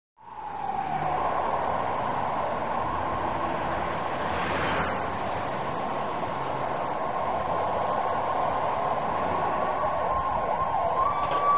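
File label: Ambulance Siren, Pause In Siren As Voice Over Loudspeaker Says, ...get Out Of The Way! Doppler By Close With High-low Siren, Away To Distant. Traffic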